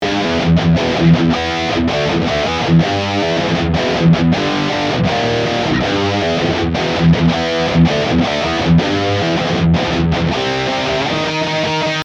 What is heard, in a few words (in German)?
Riff Idee Mit Dist PAN